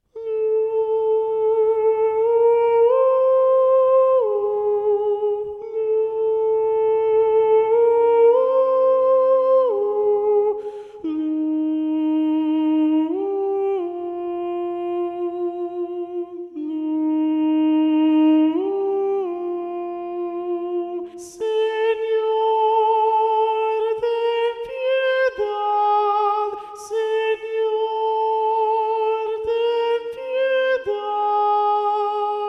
Treble 1 Predominant; Senor ten pieded

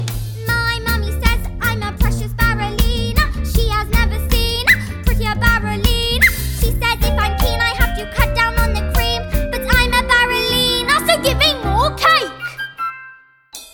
Miracle Guide Vocals; Lavender

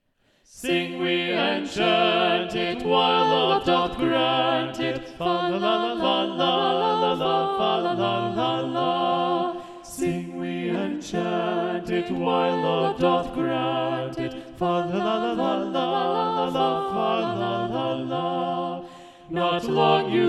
SATB Soprano Muted; Sing We and Chant It; Thomas Morley arr Russell Robinson

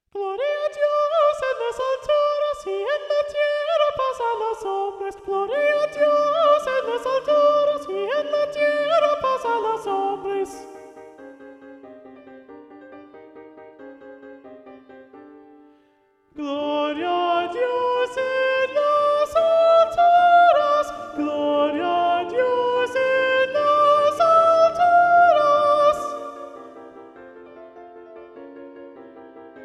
Treble 3 Predominant; Gloria a Dios

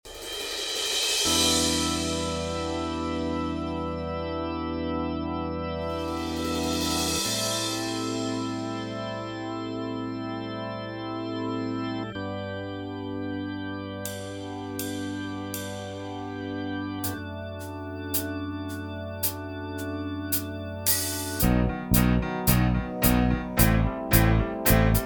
Accompaniment

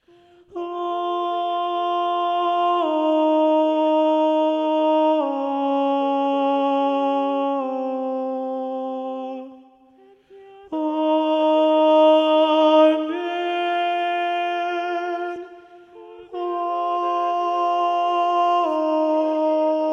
Treble 3 Predominant; Cordero de Dios

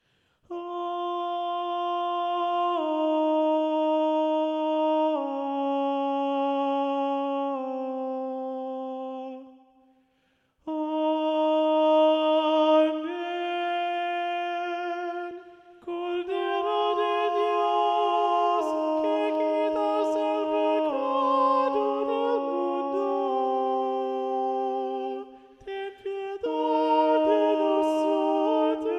Treble 2 Muted; Cordero de Dios

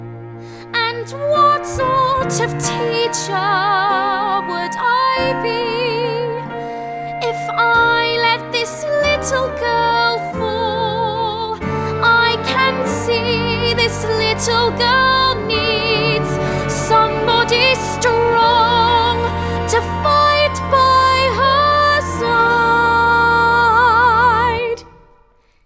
Guide Vocals

